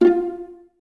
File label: Violas F 4 Pizz; Miroslav Vitous; String Ensembles